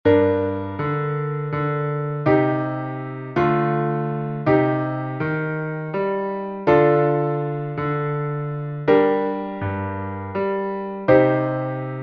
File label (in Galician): entonacion con piano, Melodía e acompañamento; Entoación con acompañamento